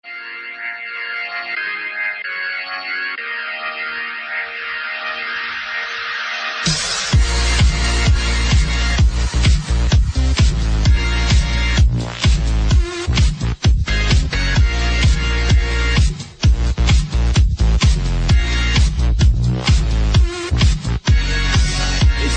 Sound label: english stuff remix ringtone